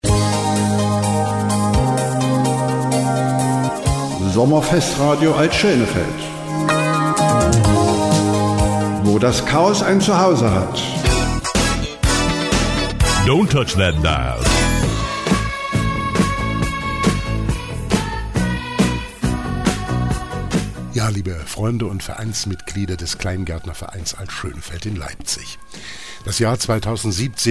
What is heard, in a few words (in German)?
Weihnachtsjingle